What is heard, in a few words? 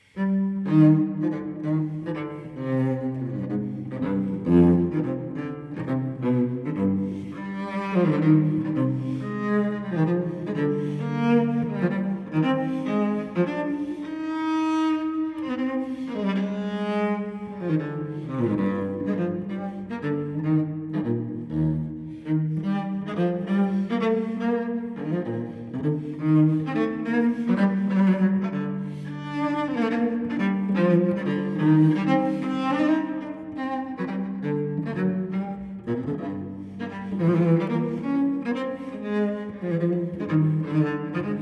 Cello Suite No. 5 in C minor, Gigue; BWV1011; Johann Sebastian Bach